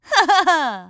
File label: haha; pauline; SM64 CO-OP